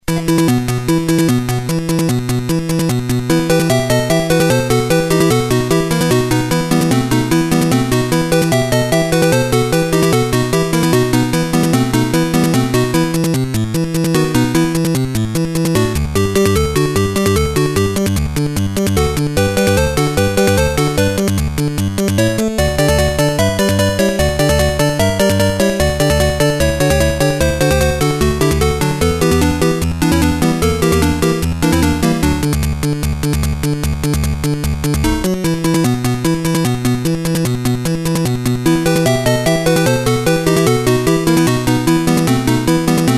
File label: Music Type B; Tetris